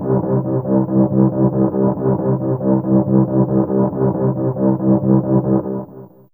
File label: V Tremolo C3, Fairlight CMI IIe; TremStr